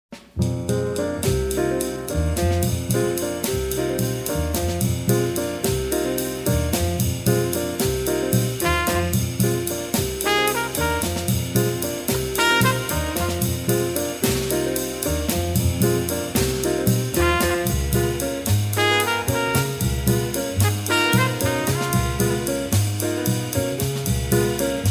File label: jazz fragment